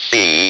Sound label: speak and spell C; ALPHABET